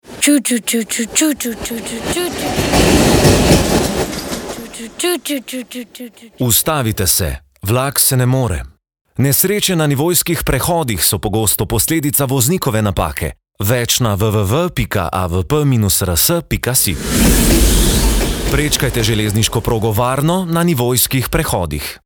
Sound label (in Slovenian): vlak; litija